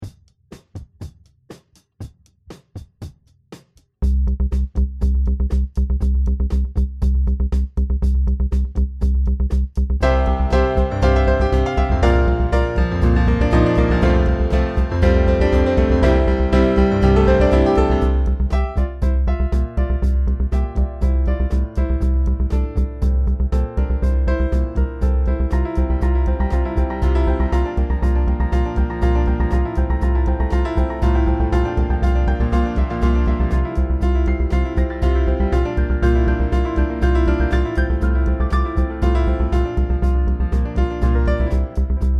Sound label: piano magic